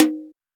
wet tom d sharp key; tom drum

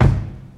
punchy kick drum sample g sharp key

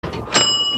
reception bell rings

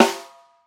small reverb acoustic snare sound a sharp key; snare drum samples

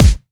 trebly kick single hit g key; Duration 0:01; Samplerate 44100 Hz; Bitdepth 24

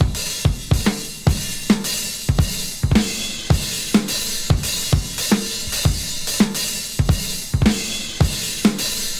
104 bpm drum loop b key

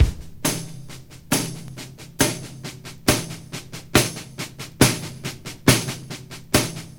138 bpm drum groove b key

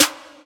prominent acoustic snare D key; snare drum samples

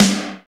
dirty snare sample g key; snare drum samples

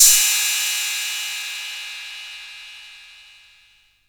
cymbal crash sound f sharp key; Cymbal drum one shot samples

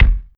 prominent kick single hit f key

